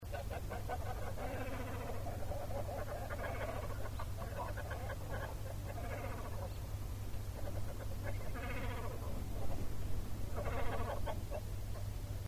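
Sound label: great cormorant call; kawau nakigoe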